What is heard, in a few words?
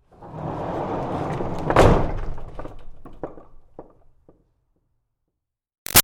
Door Barn Rolling Wood Bang; Barn Doors